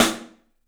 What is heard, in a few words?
STEEL S, drums; 3D Sound